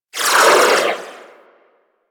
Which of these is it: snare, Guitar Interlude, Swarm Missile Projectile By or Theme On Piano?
Swarm Missile Projectile By